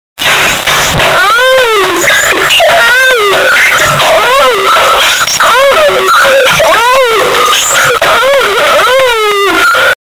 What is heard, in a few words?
asmr for sleeping